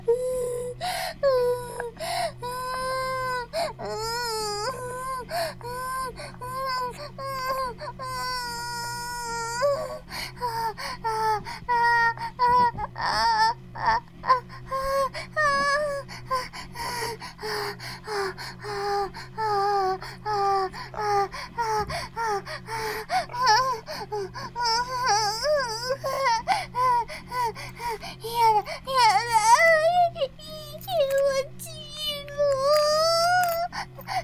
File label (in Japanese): バイブ放置, ASMR